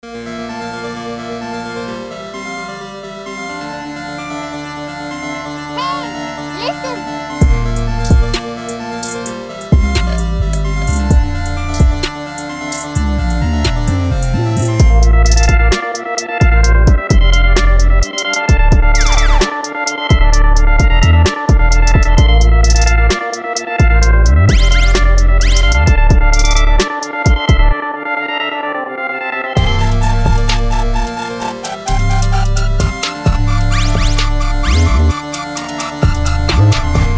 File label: 8-Bit Pack